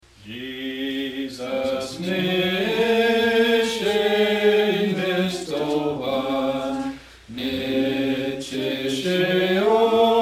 hymnbook songs, cheyenne language; Jesus Neeshenestovatse

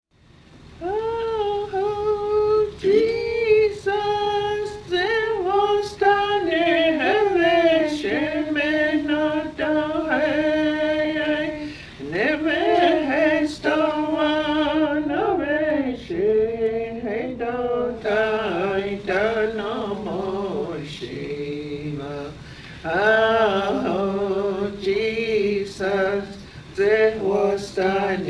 Hahoo Jesus Tsehvo'estaneveshemenoto, hymnbook

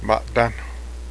bowstring

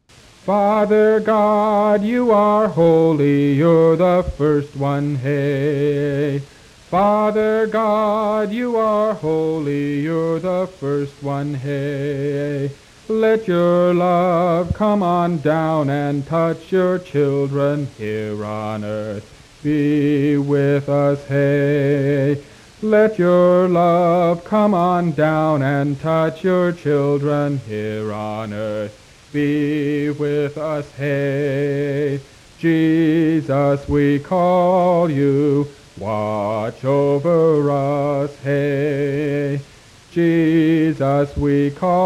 Singable English, hymnbook

